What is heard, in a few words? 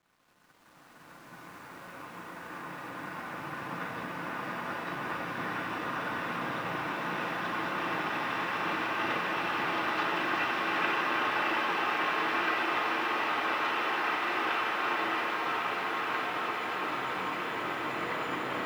Locks Rain, Chalice Well